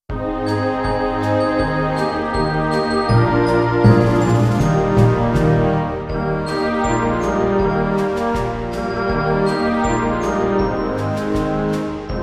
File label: Jingle Bells; Flexible Ensemble Disk No. 2